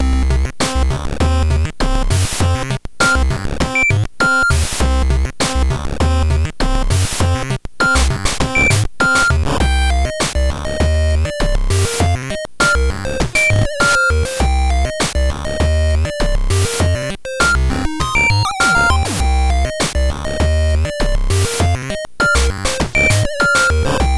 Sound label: reeds glitch